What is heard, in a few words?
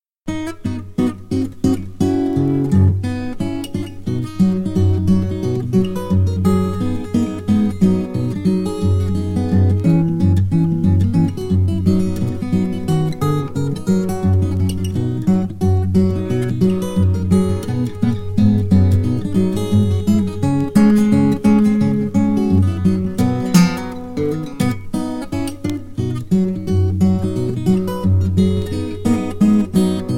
ragtime guitar